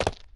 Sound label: jump, footsteps hard surface; grunt footsteps